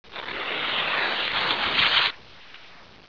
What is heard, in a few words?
rrrip